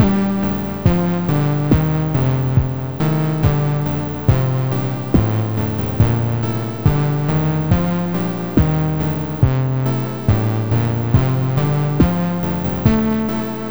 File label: Lo-fi Lavafield, retro